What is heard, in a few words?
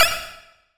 menu; Free SFX for anything